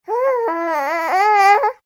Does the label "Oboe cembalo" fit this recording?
No